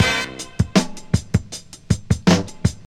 84 bpm high quality drum loop g sharp key, Free drum groove - kick tuned to the G# note; drum loops